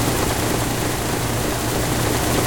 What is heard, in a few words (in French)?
Pilon Meca loop; Puddle Plus